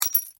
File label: Crash, Glass, Indicator, Lamp, Crack, Under, Pressure